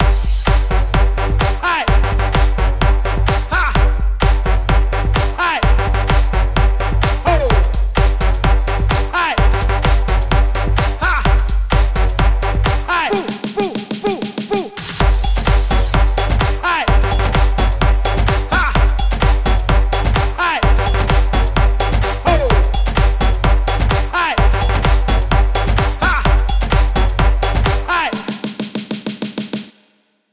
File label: Loop Full, G; Construction Kit